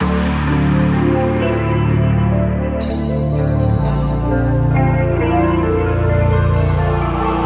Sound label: guitar, Jazzmatazz Loops